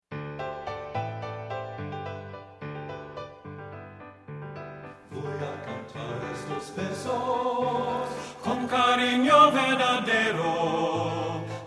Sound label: TENOR; men